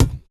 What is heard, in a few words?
kick, Roland MC-909